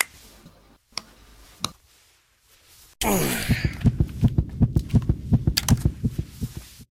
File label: injector using; Stalker Anomaly; interface item usage